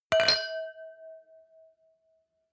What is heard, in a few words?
wine glass similar clink bounce hard; berufe; gastronomie; soundpool